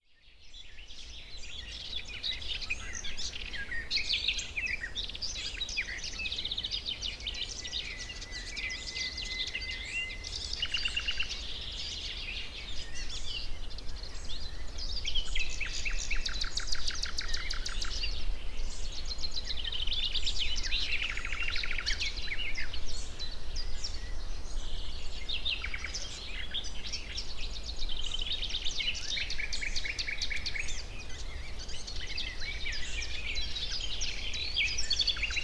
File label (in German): wiese